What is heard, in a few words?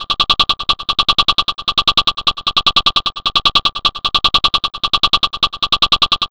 HH CLOS FX, Big Beat Samples; Big Beat Samples & More; AKAI; HHCLOS2 KIT